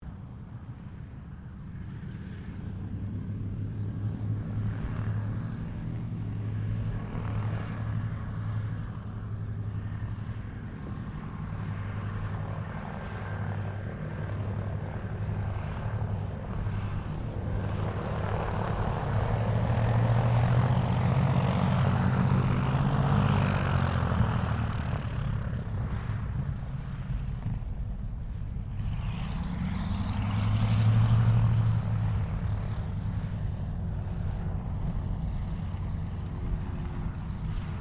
AIRCRAFT DOUGLAS DC3 TAXIING DISTANT STEREO, commercial aircraft; Airplanes